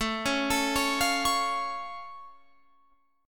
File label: A Augmented A down Guitar Open F; arpeggiated; JGuitar